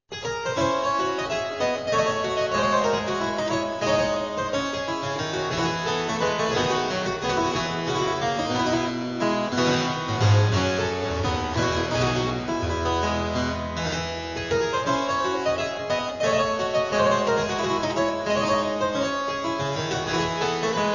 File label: D moll Courante; Louis Couperin